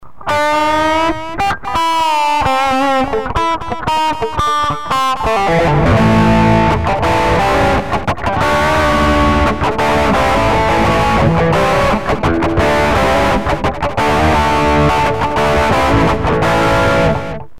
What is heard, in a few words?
funk